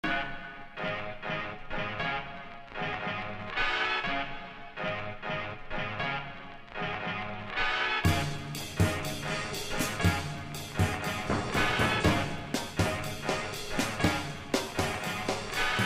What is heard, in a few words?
swing french